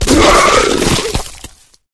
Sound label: flesh destroyed large; flood